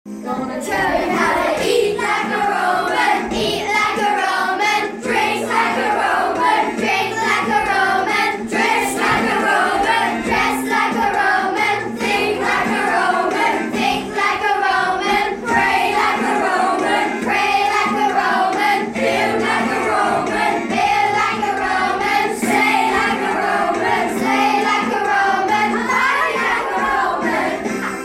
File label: singing about the Romans